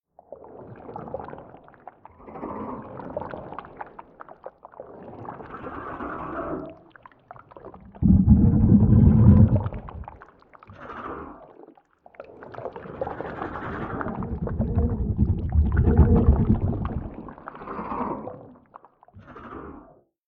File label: spawner; factorio; Factorio 0.14.21; creatures